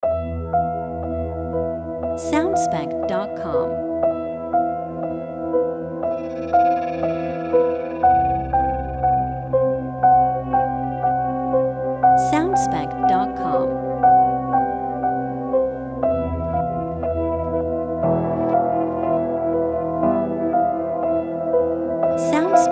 Dark And Mystic Main Loop

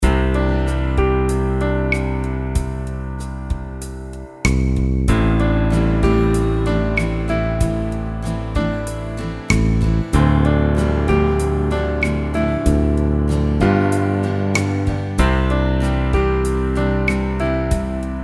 Neutral Lite Loop

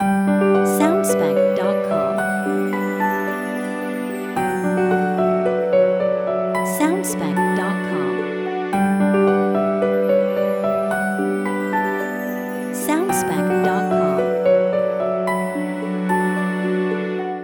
Piano short Loop; Piano From Eden

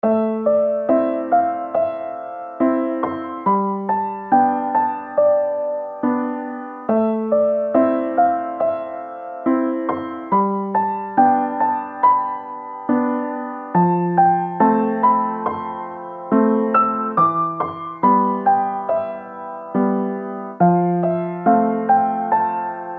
Nostalgic Piano Loop

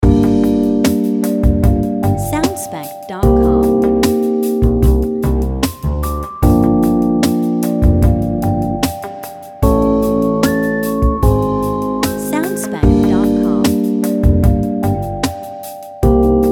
City By Night D Loop With Brass Melody

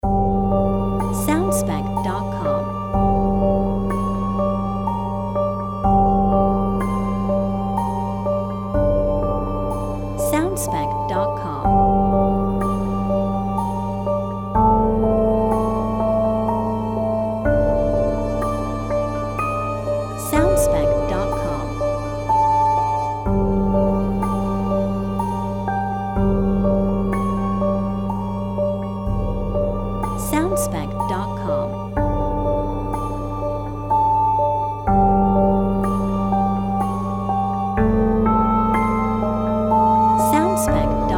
Spooky House No Melody

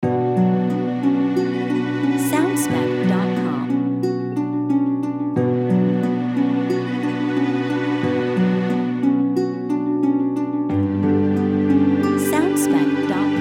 Neutral But Mystical Short Loop

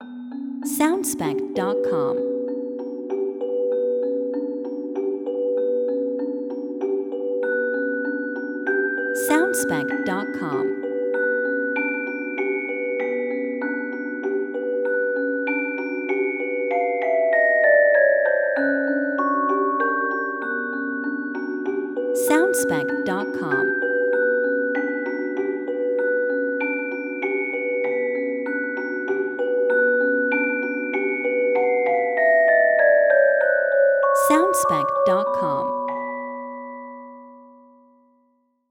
Music Box Nostalgia Variation

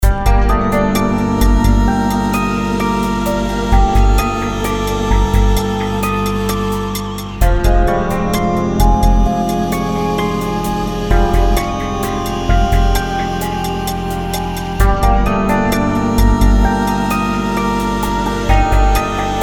Mysterious And Tense

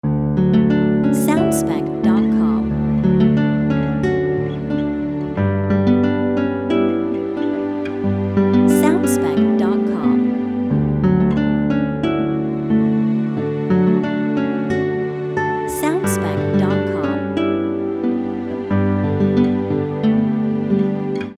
Short Guitar Loop

